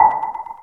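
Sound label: button, digital, single, soft, in space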